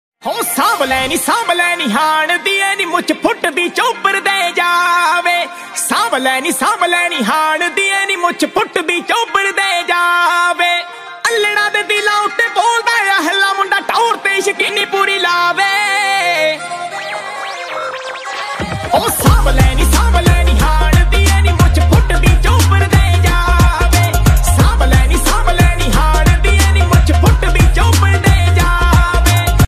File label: Dance Punjabi Ringtone